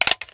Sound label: m1 carbine clip in; weapons